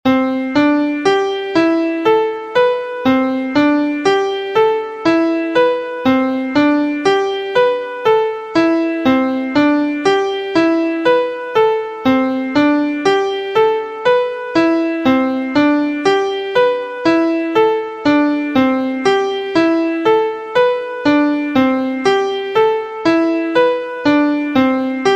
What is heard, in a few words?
Closed Position Key C; harmonic and melodic equivalence